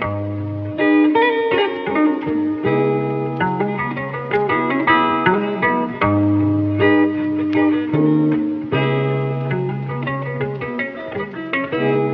guitar, Loops; Phonky

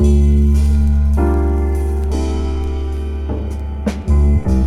bass, Loops

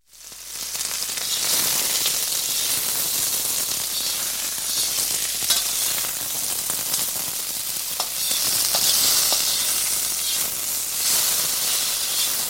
frying

